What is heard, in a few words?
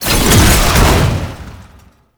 Tank Ram Ability; Warframe; Lasrian Tank; Abilities